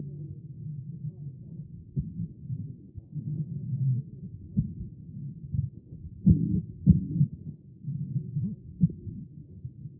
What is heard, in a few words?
CHEST; B MODE